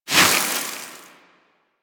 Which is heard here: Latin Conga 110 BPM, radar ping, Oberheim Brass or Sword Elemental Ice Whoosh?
Sword Elemental Ice Whoosh